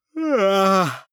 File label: yawn male vocal short; koerpergeraeusche; menschen; soundpool